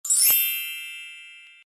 shine brightness sound effect

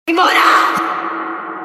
echo fast

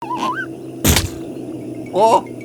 luigi splat

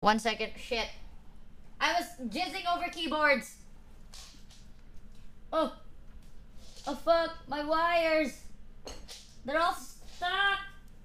keyboard

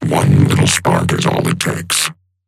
spark

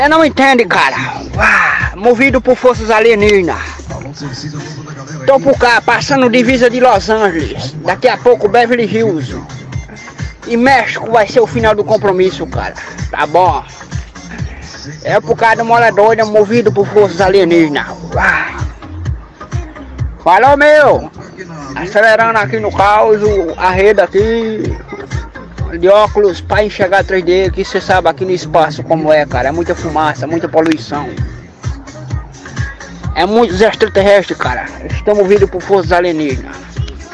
uaaahhhhh

